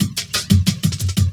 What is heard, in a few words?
LOOP -L, Drum & Bass, 180 BPM; Ueberschall; Drum & Bass Resonance